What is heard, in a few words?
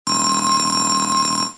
Phone Ring